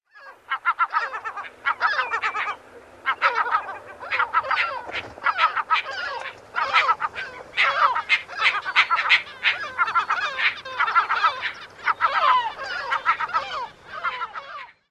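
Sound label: calls at colony, bird; UK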